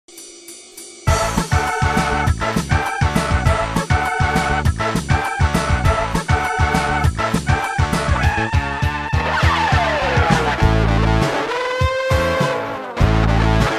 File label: Guitar Newbie; Disney's Magical Mirror Starring Mickey Mouse